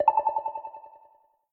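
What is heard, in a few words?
Spin Button Sound; starburstxxxtreme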